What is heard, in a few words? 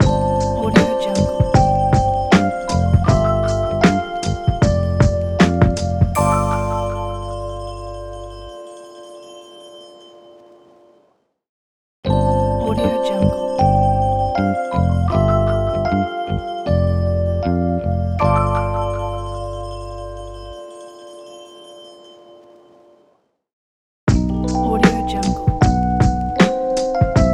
lofi hiphop logo